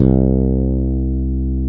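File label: rock fretless C3; Drums In Your FACE!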